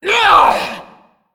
female dreadlord, Death

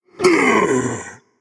Male Undead Death

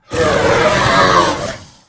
Chromatic Drake Death

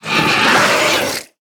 Raptor Patriarch Attack

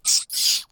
Rat Attack

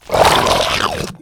Humongus Raptor Leaf Attack

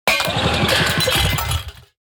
Claw Machine Death

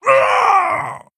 Male Tauren Death

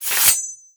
Dagger Underlay Play

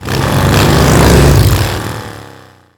Hogchopper Attack

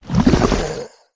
Acidic Swamp Ooze Attack

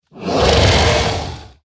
Dragonmaw Sky Stalker Attack

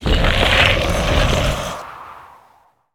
Enter Play

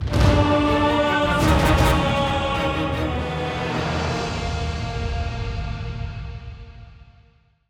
Legendary Stinger

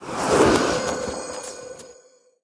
Magic carpet attack

